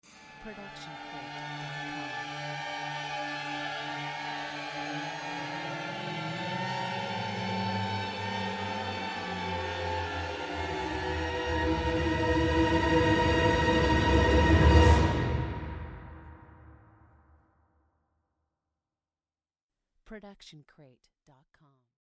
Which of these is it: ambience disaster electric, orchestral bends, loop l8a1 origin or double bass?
orchestral bends